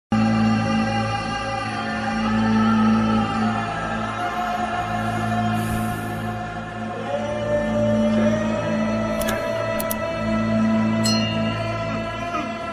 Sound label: interval sad bgm ringtone movie bgm ringtones